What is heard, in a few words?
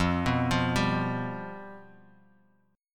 F Suspended 2nd Flat 5th, chord; x,8,9,10,7,x,x,x